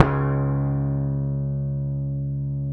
C#, Bass; Sampleheads; Will Lee Bass Library

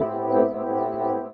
Chords Tones; Equipped Music; Loungin House

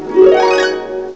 HARP ARP; EFFECTS; M8; CMI Factory

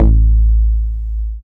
bass techno

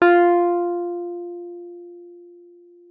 WUF F4, WURLITZER; Xsample VOL-1

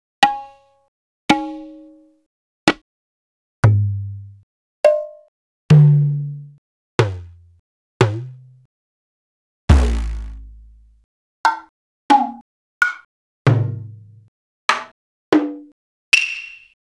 R8 Ethno Perc; X-Static Goldmine

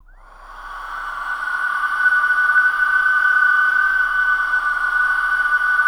OVERTONICS, VOCAL WIND, Jazz FX; Spectrasonics; Vocal Planet

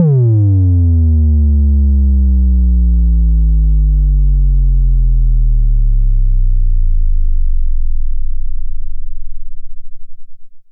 Sub Kicks, Bassdrums

